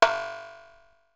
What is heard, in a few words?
darbuka note